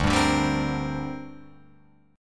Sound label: D 7th Suspended 4th Sharp 5th down Guitar Open F; D7sus4#5 strummed